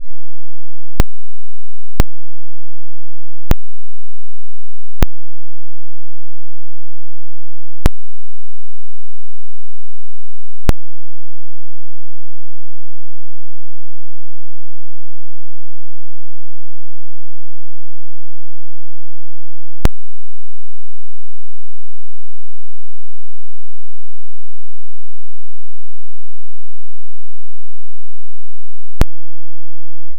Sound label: .5Hz To .001Hz Square